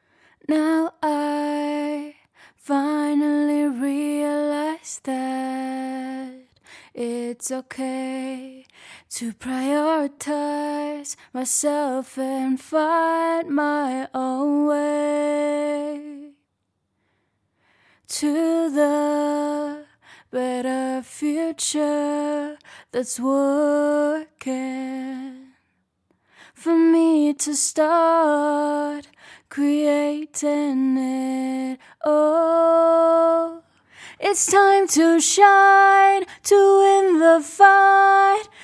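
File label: Pop 110 C minor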